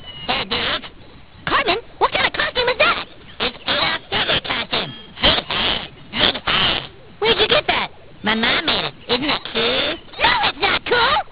hit

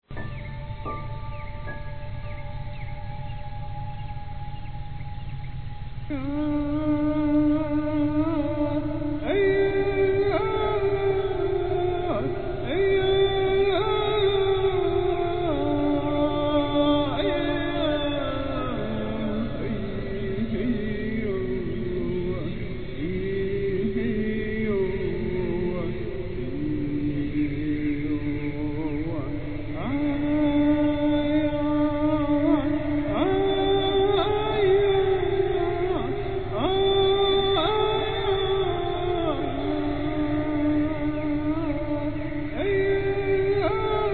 tribal

